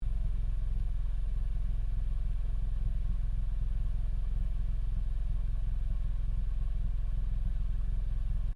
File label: Car Toyota Corolla Idle Exhaust Loop Stereo, hatchback; Vehicles; 2009 Toyota Corolla hatchback; Cars